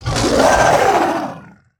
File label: Emberscale Drake Attack